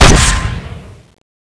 at4 shoot

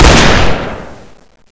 shoot

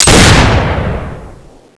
musket shoot

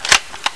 blaser clip out

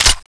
mg36 clip in

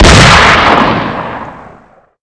blaser

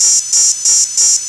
stun rifle low battery

